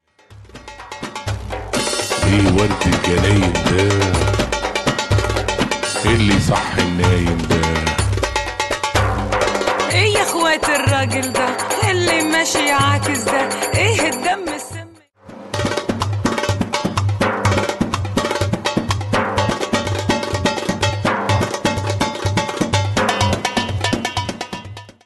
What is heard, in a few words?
solo pub